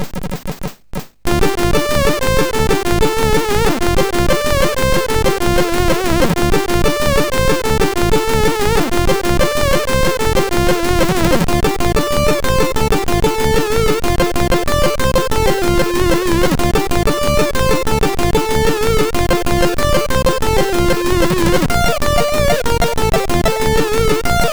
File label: humppa MOS6581R2